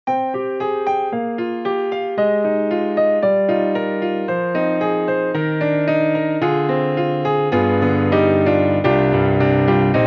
Hybrid Compressed Rock Piano

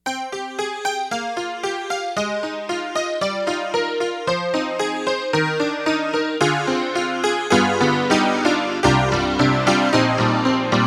Roland U-110 A.PIANO1

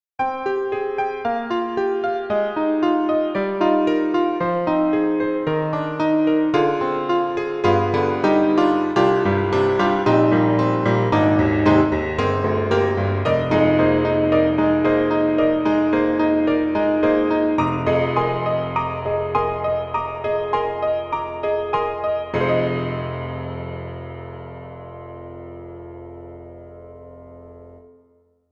Vintage Piano Piano In A Church

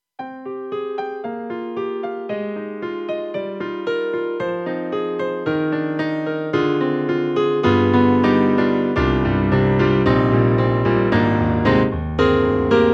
KORG M3 XPanded Natural Warm Grand 2; M3 Version 1 Wave

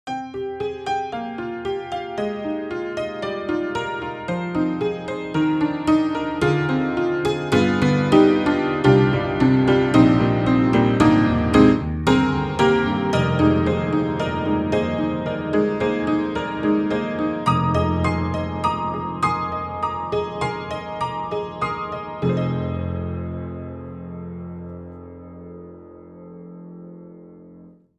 Upright Pianos Barroom Upright; Synthogy; Ivory II; Salooney Tunes

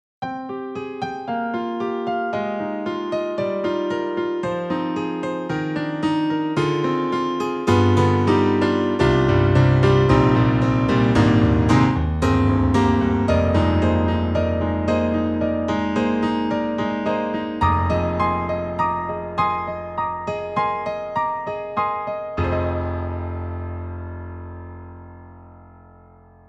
3 Way Stereo Grand; KORG KRONOS 2

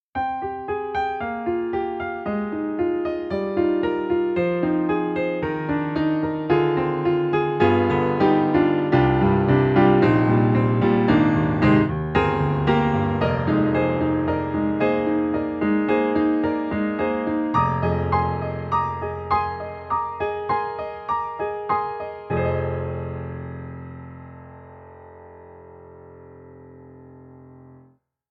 American Grand, Player Mic; VI Labs; True Keys

